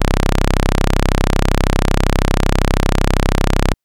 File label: Synth Saw c0; TrashLibrary